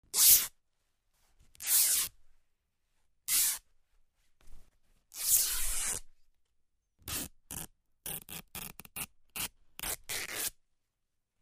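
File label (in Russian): рвут ткань разные варианты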